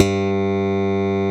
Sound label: Slap Bass 1 A0